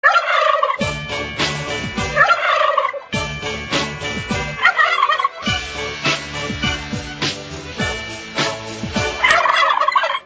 Turkey

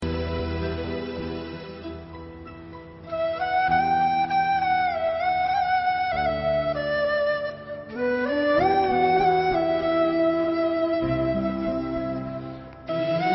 back ground music; 100percent love

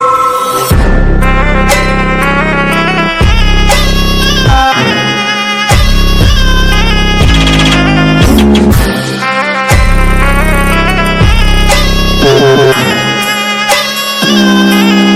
switch ringtong

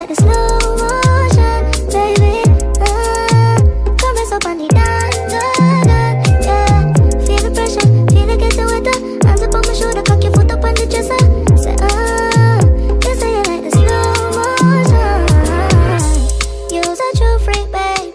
slow motion, ringtone

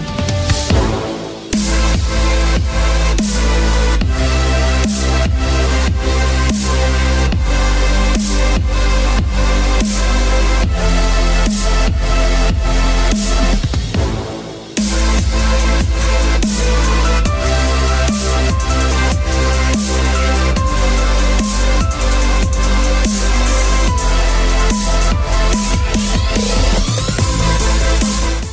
Trap Remix, ringtone; PUBG

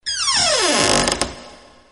door creepy

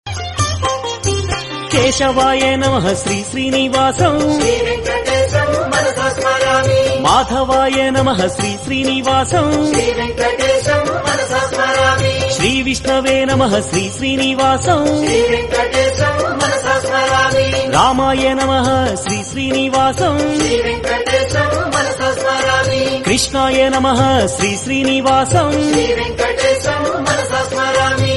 telugu devotional, ringtone

